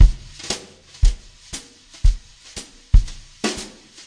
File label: jazzy nice flam snare, drum loops and breaks